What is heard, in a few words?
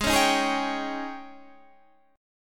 G sharp Major 7th Suspended 4th, Guitar, Standard; G#M7sus4 strummed; JGuitar